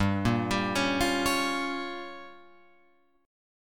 G Minor 7th Flat 5th, Guitar Open F; Gm7b5; arpeggiated

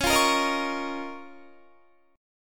Db 6th Add 9th Db down Guitar; Db6add9; strummed

